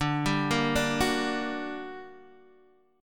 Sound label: G Minor Major 7th D